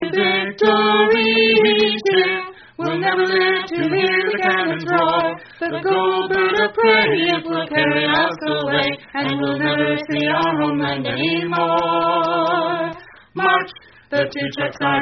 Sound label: Cheer